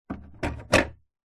household dehumifier plastic reservoir pull out

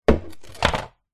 sport skateboard set down on deck; zapsplat

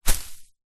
plant fern broad leaved hit ground; zapsplat

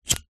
household cigarette lighter flint flick

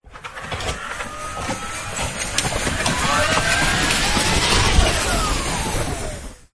Sci-Fi Power Up; Ryan Van Eerde

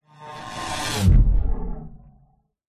sound design hit electrical arc into a thud growl; zapsplat

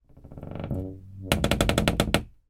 household door creak wooden hollow

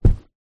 footstep single boot carpet wood floor; foley; zapsplat

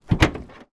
car trunk boot open; transportation

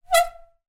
transport bicycle brake squeak loud; zapsplat

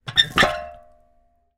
household garbage can metal lid open

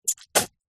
human spit

